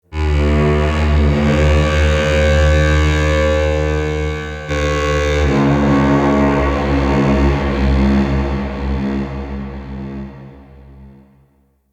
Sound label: Castle Distant Dark Horn